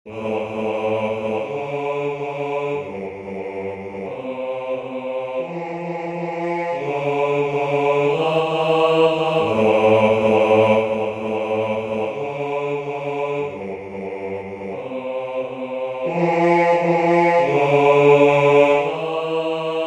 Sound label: bass; Nada te turbe